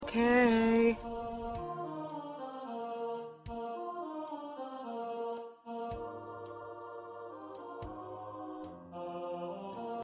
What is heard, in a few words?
Ensemble, choir parts